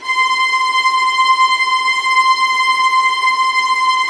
VIOLINT D, Synclavier Strings; ILIO

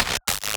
Glitches; Antidote Advent

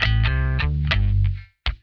FULL OCT, Funk Guitar; Zero G - Funk Guitar

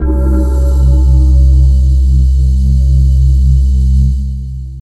pads C; Zero-G; Total Drum Bass

